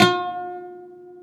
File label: UD F 4; SoundBytes; Early Patches